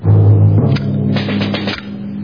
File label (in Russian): malij baraban melodiya ugrozhayushaya